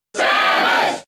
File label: Cheer English; Samus; SSBB